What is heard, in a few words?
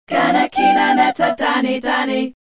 style, African vocals sung